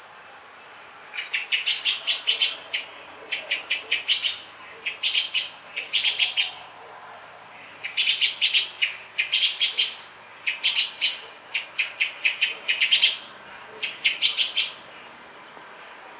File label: song thrush call; Song thrush (Drozd śpiewak); Recorded on 2025-05-15 near a river